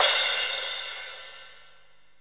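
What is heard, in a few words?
cymbal, dance drums; Best Service ProSamples vol.15; AKAI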